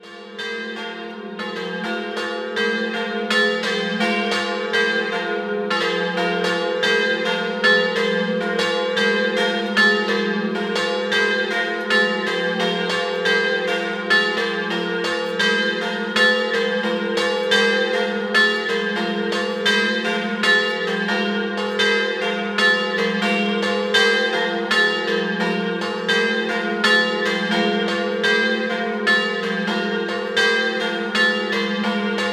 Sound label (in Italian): Doppio, campane; Sauris Di Sotto